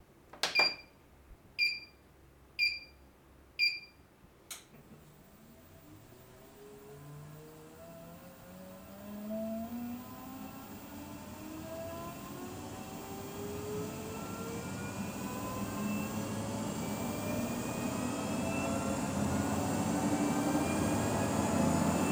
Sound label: Treadmill